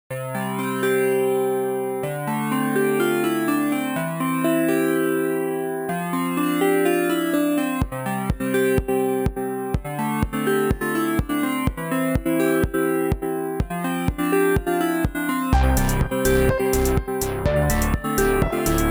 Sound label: space beat